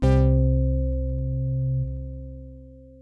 SYNTH; Medival Synzzz

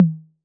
Tom Mid B 808, Clean, Digital

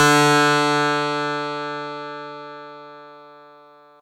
Fuzz Clav DX100 D3

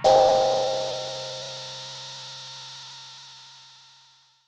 SYNTH Peace Chordz; Gasious Giantz

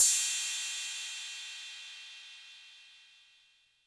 Cymbal 808 Color

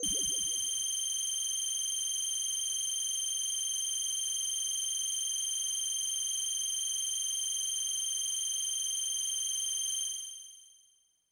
Bell Air Kawaii G-1

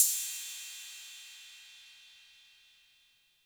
Cym A 808 Tape Decay C; Cymbal; Clean

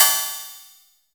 Cymbal

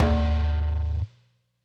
Djembe Slide Verb

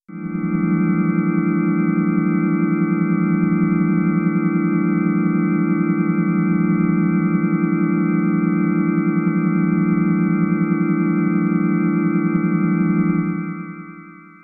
PAD Haunted Manzion

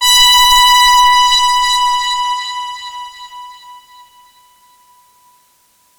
big brass B4

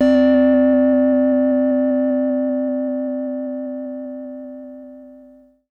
SYNTH

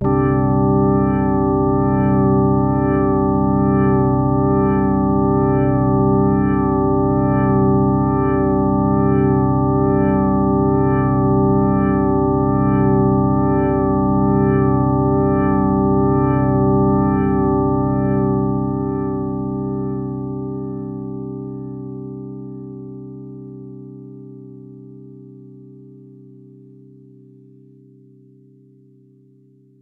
PAD Organ; Professor Malbadors

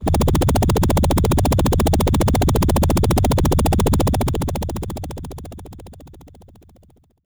Helicopter FX DX100 Gs1

